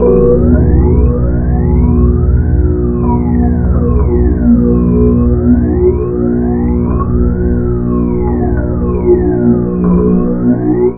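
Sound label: filter, right; Big Beat Samples & More; AKAI; TEXTURES KIT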